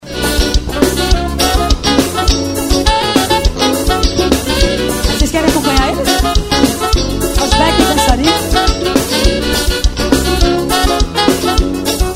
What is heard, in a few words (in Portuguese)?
forro ao vivo